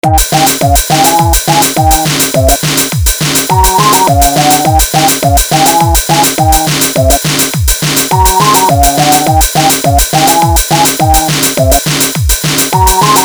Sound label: ringtone